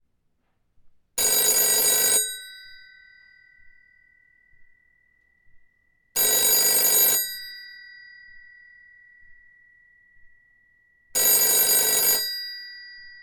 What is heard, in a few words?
Analogue Phone Ring